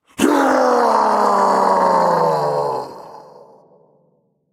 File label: fear; smite; jorm